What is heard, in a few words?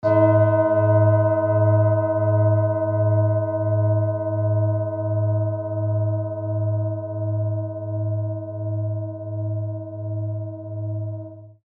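tibetan bowl; neptunset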